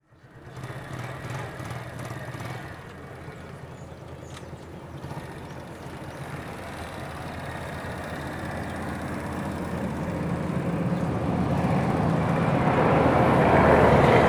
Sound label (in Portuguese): Motor de moto ligado caminhao passando; maquinas